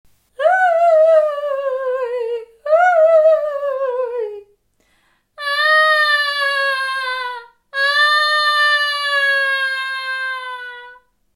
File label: Crying